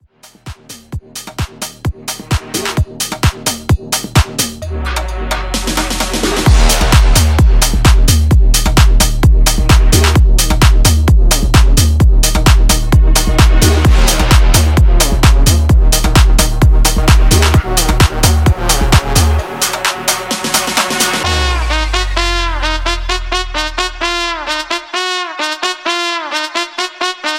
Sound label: Blend; Remixfreakz